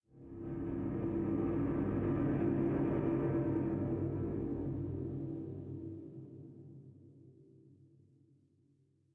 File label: sting metal groan; Rust